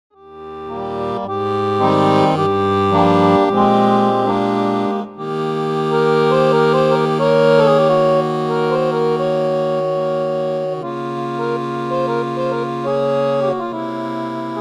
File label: cassotto preset